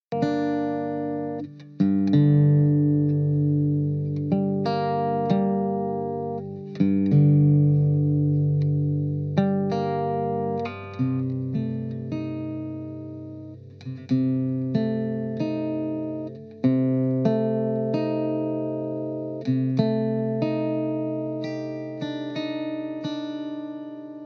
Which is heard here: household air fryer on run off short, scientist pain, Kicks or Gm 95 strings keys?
Gm 95 strings keys